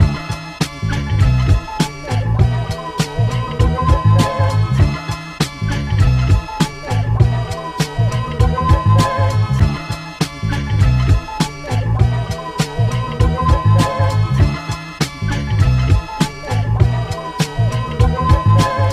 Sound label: Instrumental; Car Door (Unused); Friday Night Funkin' Vs. Ron